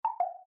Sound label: bip bop